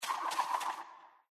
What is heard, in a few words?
footstep penguin fire